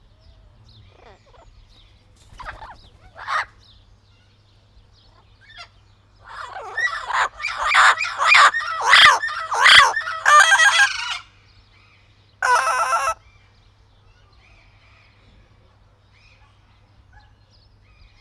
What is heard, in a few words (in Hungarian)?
kekhomloku amazonpapagaj; állathangok; nyíregyházi zoo 2010